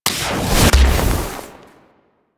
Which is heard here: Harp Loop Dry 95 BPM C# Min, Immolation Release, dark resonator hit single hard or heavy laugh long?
Immolation Release